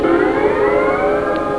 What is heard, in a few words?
pulled chord; Sound Effects for Multimedia - Volume 1